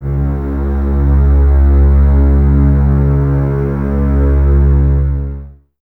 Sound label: BSS C#2, String Ensembles, Basses, f Full; Miroslav Vitous; 90 s Sample CDs